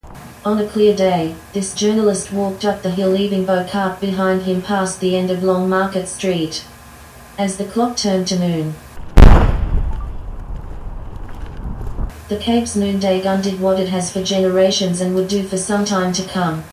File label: Noon Gun